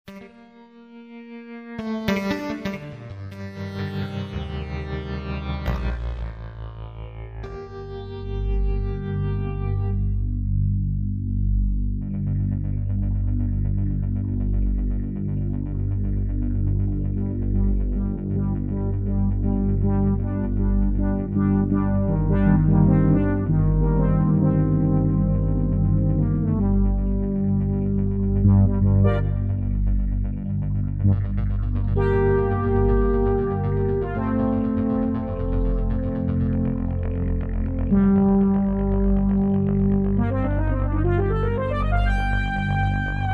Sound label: Arp Solo